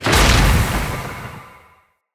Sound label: Lightning Slam Impact; Characters; Enemy; Abilities; Sinecure; Banneret